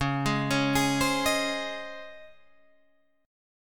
C sharp Major 7th Suspended 2nd C sharp, Guitar Drop D